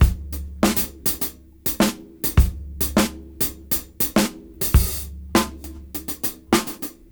Tricky 101 BPM; AMG - Keith LeBlanc Phat Butt Jams

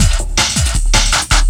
LOOP, 160 BPM, Drum & Bass

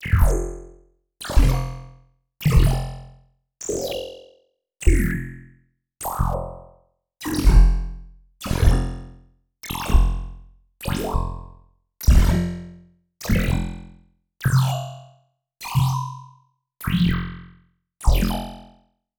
Bass Stabs F BPM100

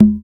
Congas; African Contemporary; Spectrasonics; Supreme Beats

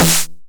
909 SD Tape Sat

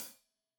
Hat Loose, Hi-Hat, Cymbals Stereo

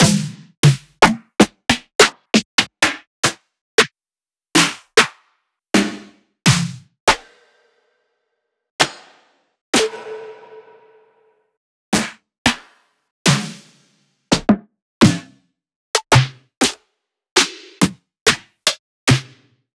Two Shots Snare; Sliced

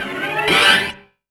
Yamaha CS1x Drums

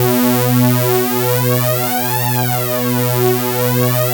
PHAT ACID B3; PHATYACID

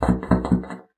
BASSOON; Xsample VOL-6

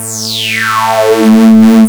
OB 18 A3, Rare Synthesizer; Hollywood Edge - Giorgio Moroder Rare Synthesizer Collection; OB 4 VOX.

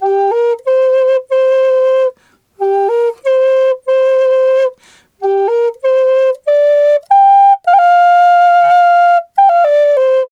G2 93, Didgeridoo; Big Fish Audio - Didgeridoo and Other Primitive Instruments; FLU 93 BPM

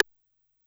C#2 Analog Side Stick, Dance Kit

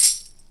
KOMMERZDRUMS

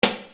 Snare Dr; Best Service; Ethnic Journey Sound Cube; EuroDance Essentials; Snares

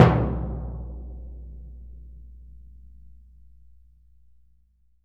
TMP C#, Synclavier Percussion; ILIO; Synclavier Percussion 2; World & Orchestral

